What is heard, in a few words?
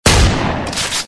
Shotgun; weapon